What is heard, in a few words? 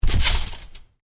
Trampo frontdrop, Trampoline, bounce on: frontdrop on canvas trampoline; Miscellaneous Sport; Stuart Duffield